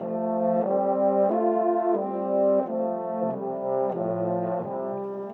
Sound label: French Horns, Orchestral; Sonic Foundry (Sony Creative Software); Orchestral VOL-2; Modern 25